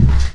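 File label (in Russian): кнопка цифровой шумный близкий хрустящий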